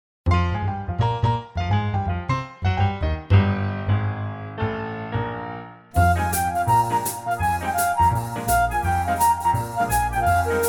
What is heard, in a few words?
flute